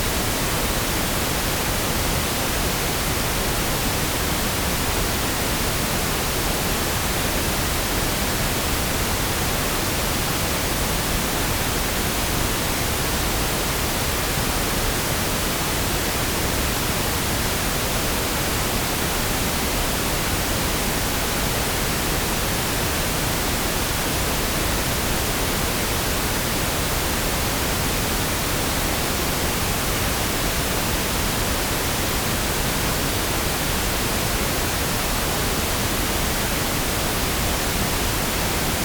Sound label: Pink filtered; Pink 0vu 20-20k filtered 96k 24bit DScope